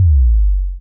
Super Low; Roland SPD-S

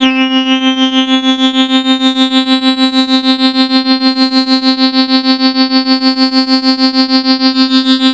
C3, Synth Line; 90 s Sample CDs; NiceBeats; SynthLine VOL-21

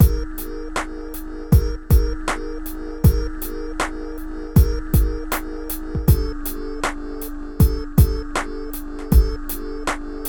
PHATT BEA; E-MU Formula 4000 Series Vol. 1; Hip Hop Nation

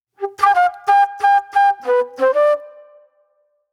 Soul-Jazz Flute, Call And Response

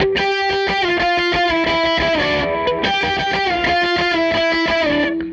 Les Guitar Loop

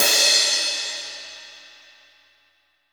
CRASH, OLD ZIL CRS

